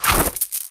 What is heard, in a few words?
point; nahyuta